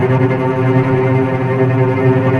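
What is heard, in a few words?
tremolo, strings; East West - The Ultimate String Collection; VCS TREMOLO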